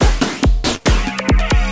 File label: Attack Loop 140 Bpm